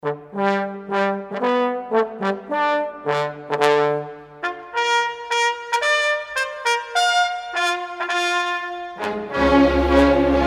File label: waltz